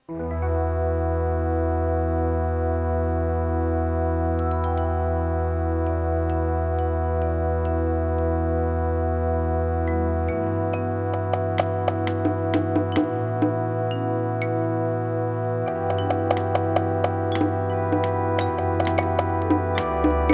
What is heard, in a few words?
SC-55MkII; via CT2800 gameport and SoftMPU after SysEx Delay Enabled after MT32EMUL correct